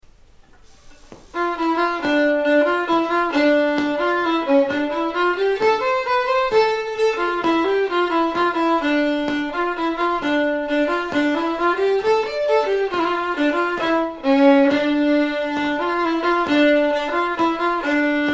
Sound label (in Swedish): schottis finland